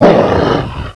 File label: predator pain